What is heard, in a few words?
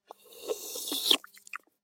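liquid is drawn in, drawing in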